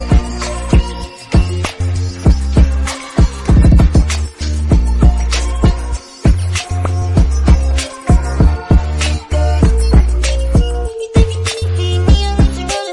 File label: Instrumental Ringtone